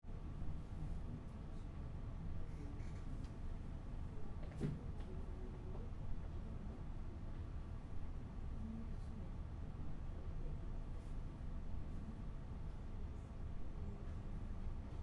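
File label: room tone footsteps murmur Hebrew; atmosphere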